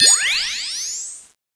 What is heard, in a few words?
jet gun beep; weapons